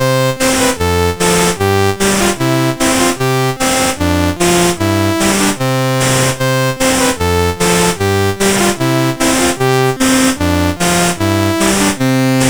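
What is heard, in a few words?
Country Gardens, MOS 8580; CSG8580R5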